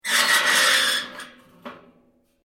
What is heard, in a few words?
Metal plates scrape; Sound Effects:Materials